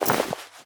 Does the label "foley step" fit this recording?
Yes